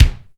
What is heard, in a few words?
kick, natural kick; Roland L-CDX-01; KIK Natural K1